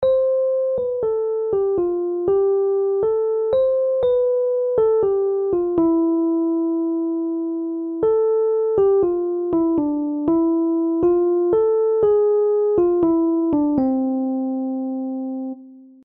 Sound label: fly melo